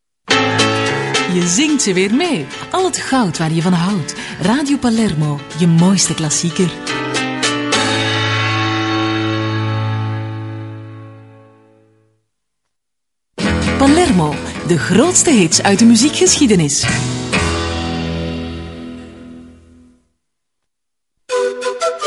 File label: jingles; Palermo